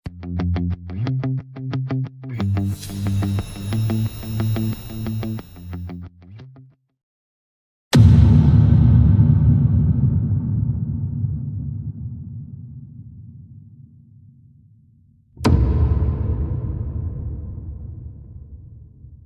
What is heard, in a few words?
booms; buildings general